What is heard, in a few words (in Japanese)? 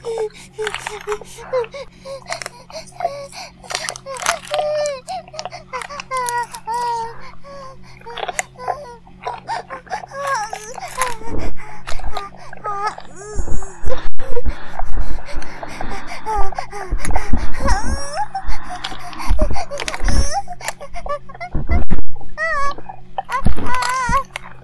バイブ放置, ASMR